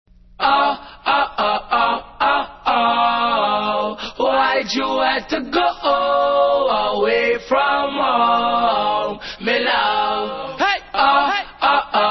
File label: acappella